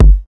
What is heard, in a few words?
DUTCH KICK; Masterbits; Soniq Elements